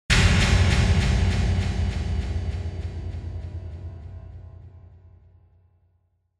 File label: Impact, High Delay; Onoma